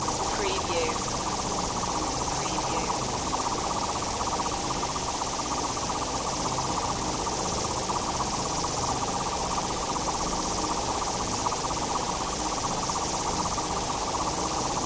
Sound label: flutter glitch drone loop